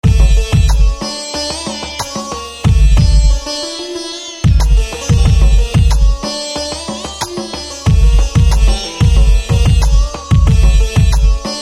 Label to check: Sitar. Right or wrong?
Right